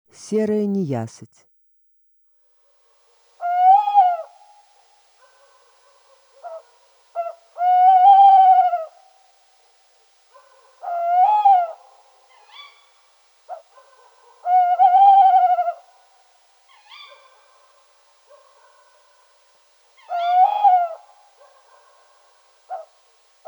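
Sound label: tawny owl voice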